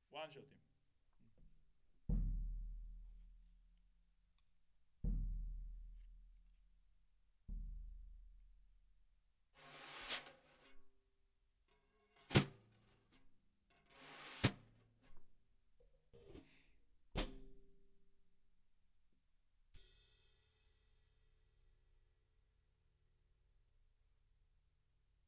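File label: SNR Top, snare top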